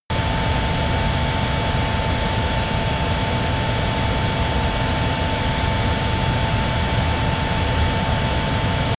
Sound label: military jet flanker-c turbine full power direct